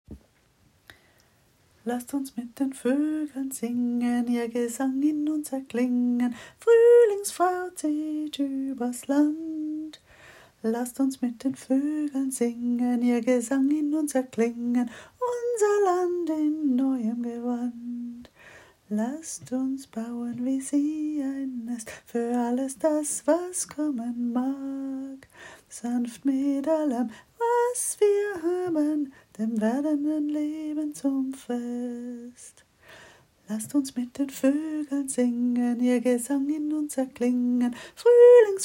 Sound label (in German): Lasst uns mit den Voegeln singen